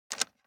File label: DICE on Plastic, Grab, Standard, 4 Four Dice; Samples; ArticulatedSounds; DICE Sfx Party (Extended)